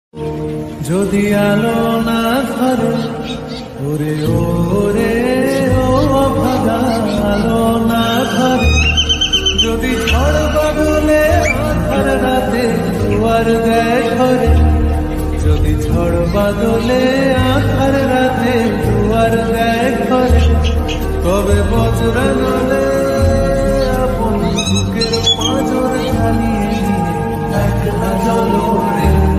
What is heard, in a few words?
Song, Bengali Ringtone; ringtones